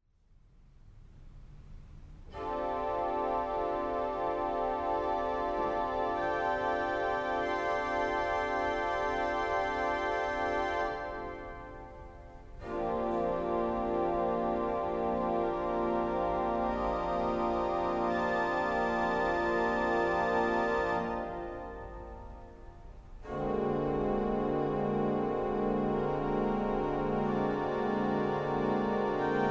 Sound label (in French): Interlude orgue